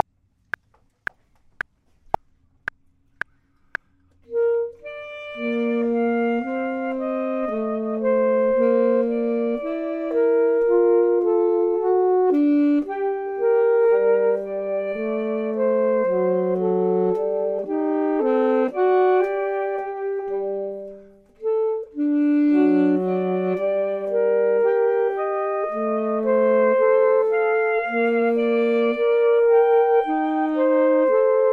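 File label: both, Saxophone and Clarinet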